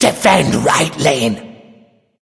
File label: Laughing Skull; Defend; Bakasura